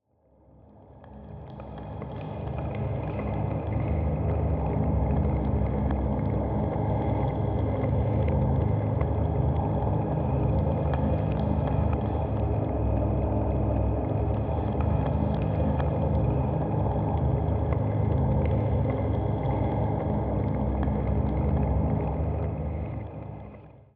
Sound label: Atmosphere Charred River F; ignite